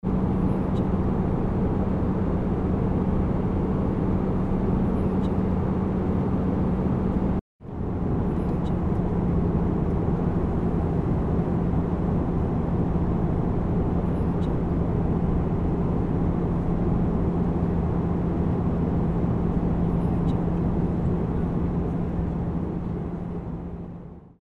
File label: airplane interior loop; ikosonik